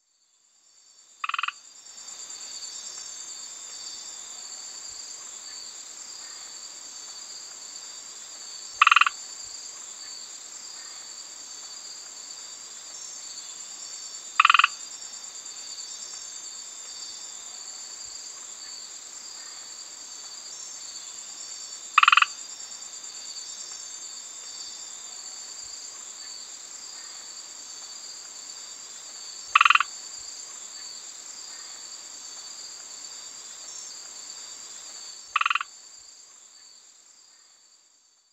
Hyla punctata

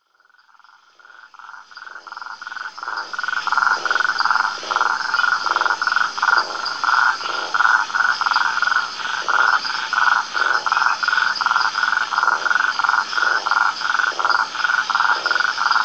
Rhinella castaneotica

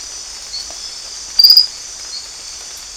Hyalinobatrachium tricolor; guyane

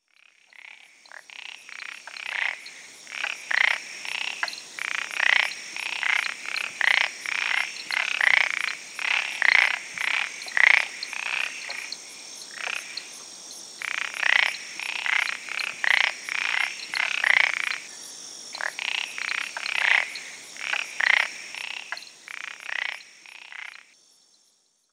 Pseudis paradoxa

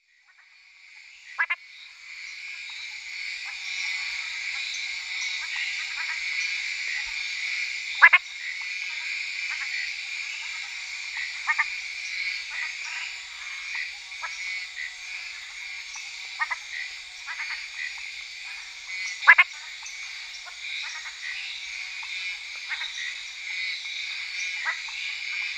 Hyla calcarata

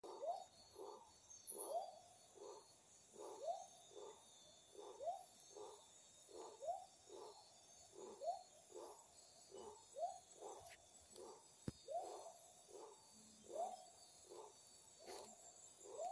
Leptodactylus pentadactylus

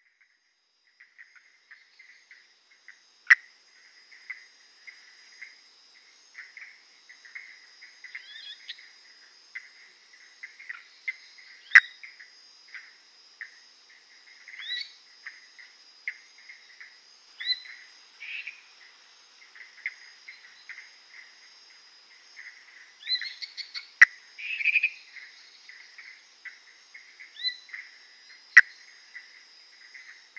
Phyllomedusa tomopterna

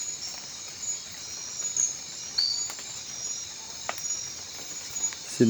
Hyalinobatrachium cappellei

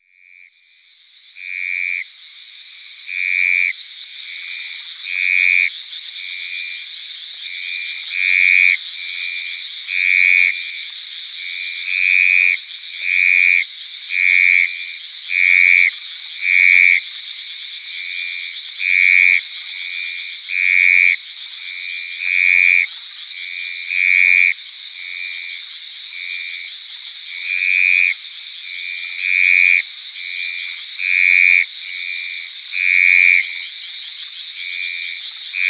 Ceratophrys cornuta

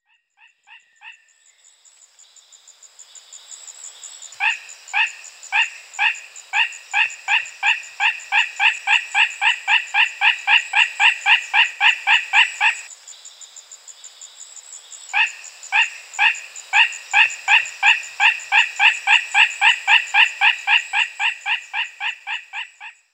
Otoprhyne pyburni

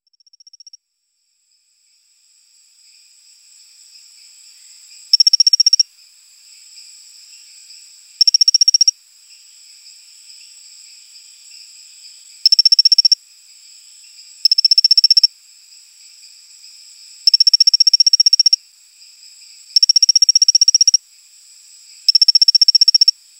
Colostethus baebatrachus

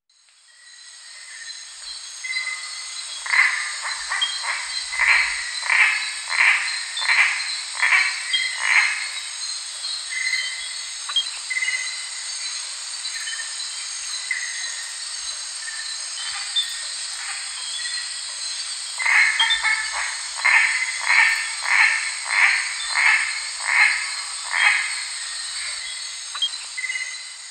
Osteocephalus oophagus

